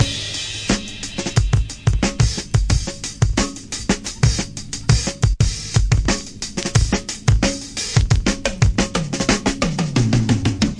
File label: 89 bpm, drum loops and breaks; authentic mover